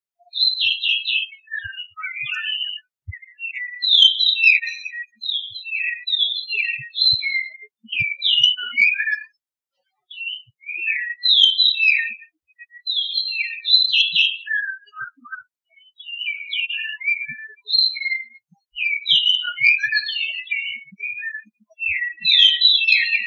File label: redtailed palm thrush Kinshasa at night